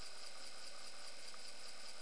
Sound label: Spin; activegs ios